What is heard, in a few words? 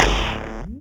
perc